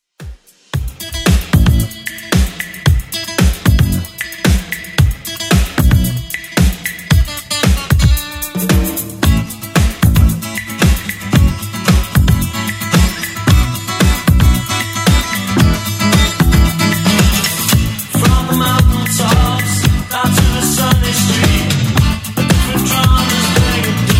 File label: 80s Rock Redrum 113bpm